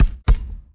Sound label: best basketball bounce; heart; nova sound